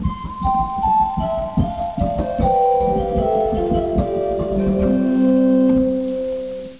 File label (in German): Holzfloete 4; Martin